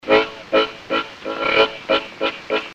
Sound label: tongue roll, harmonica